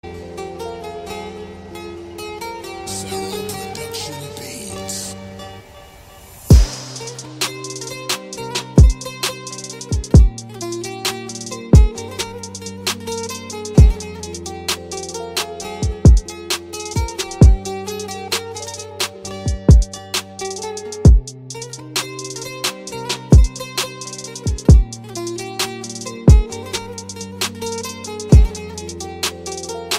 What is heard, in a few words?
Deep KURDISH SAZ